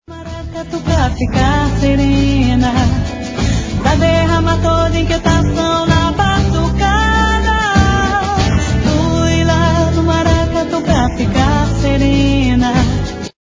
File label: vocal, chanteuses